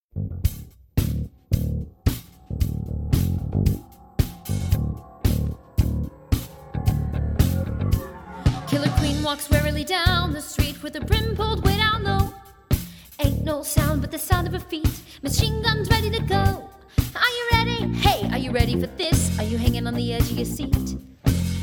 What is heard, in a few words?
vocals